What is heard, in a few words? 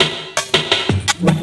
LOOP SD, 168 BPM, Drum & Bass